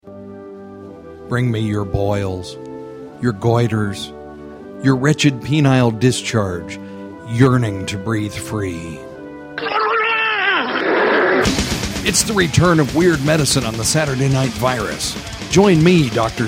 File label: promo music hotter